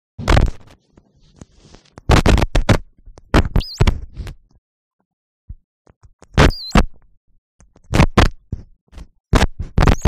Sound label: Crackles Small Conta, arcs & sparks